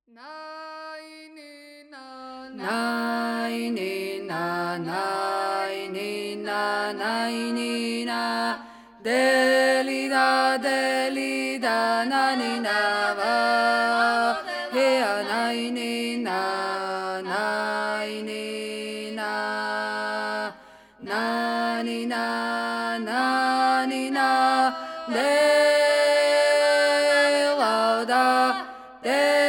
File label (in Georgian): ნანინა იმერული მესამე ხმა; გალობის უნივერსიტეტი; სასწავლო